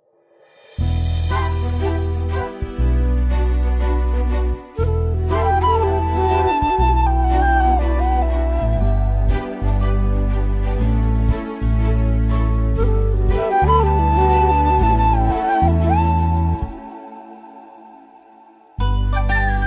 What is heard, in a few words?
love bgm love bgm ringtone; premalu